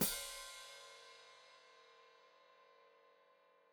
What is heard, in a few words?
Hat Closed Open, Hi-Hat